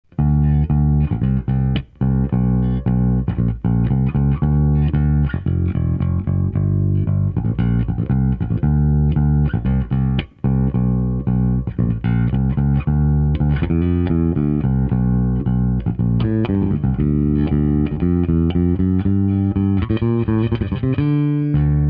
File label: p rounds; custom diy 2x15 cab build